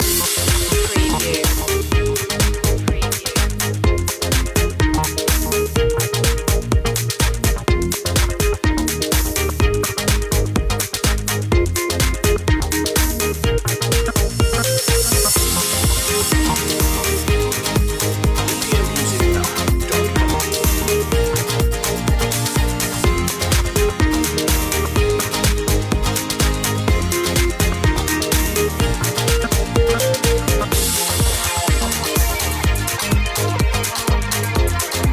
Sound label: wet water loop long; media music now